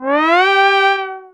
Rise Synth; instrumente

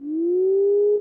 Owl

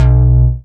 Wonder II, baesse

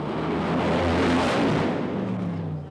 Dragster

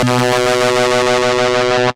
Ping Pong

